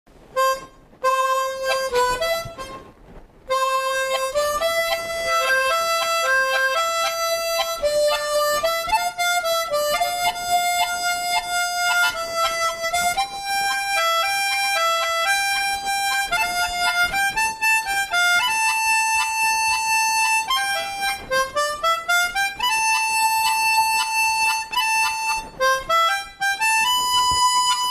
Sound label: Harmonica song; SOUND OF MUSIC